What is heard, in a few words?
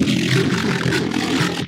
Growl Bass